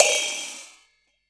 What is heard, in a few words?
Perc Atom, One Shots, Drums, Misc Percussion